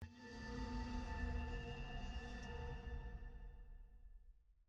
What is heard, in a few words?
hum